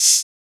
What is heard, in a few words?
Open Hat